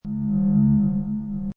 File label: Space Scifi Sound Core Hum, Sci-fi pulsating core; Space Scifi sound - Core hum 1: Sci-fi pulsating core or gyro, oscillating sound. Science laboratory atmos.